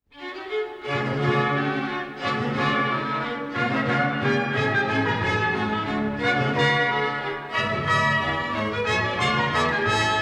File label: Orchestral Suite, Bourree; Bach; Orchestral Suite No 3 D major BWV 1068; 바로크음악; Watermusic